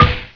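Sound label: club impact; ROADRASH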